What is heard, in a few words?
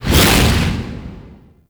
Heavy Hammer Whoosh